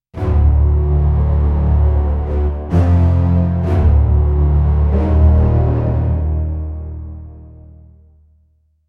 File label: music score for a short, film score